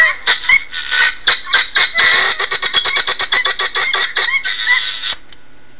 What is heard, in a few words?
guinea goose